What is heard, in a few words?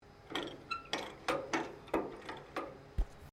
PETROL BOWSER CHARIOT TYPE OPERATING SHORT, Various old or antique devices; Machinery (Sound Effects:Machines)